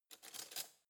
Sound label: cutlery spoon drawer grab soft